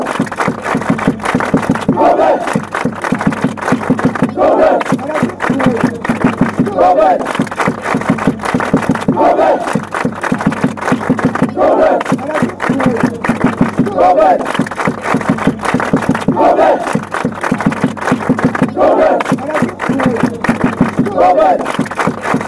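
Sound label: clap, football songs and soccer chants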